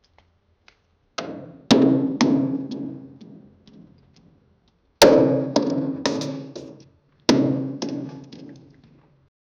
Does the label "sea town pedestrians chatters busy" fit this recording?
No